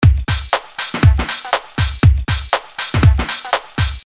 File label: Retro Tinged Garage House